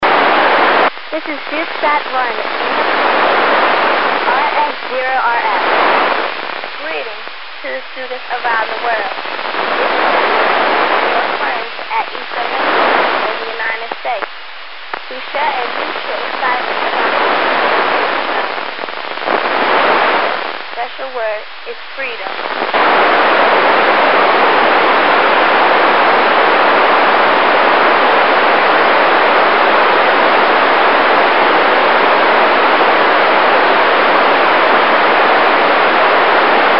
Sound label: fm sstv; suitsat